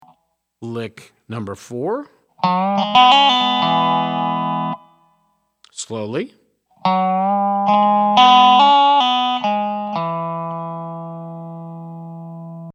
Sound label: resonator pedal, steel guitar